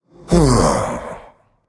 sigh

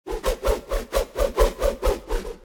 Recall Sword Spin; Smite; Susano

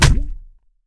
hit trunk; smite; god ganesha skin11

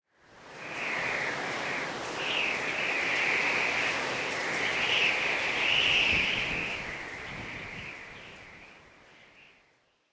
ambience whistle wind; smite

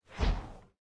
axe swish; smite; chaac

